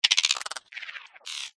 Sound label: science fiction steampunk glitch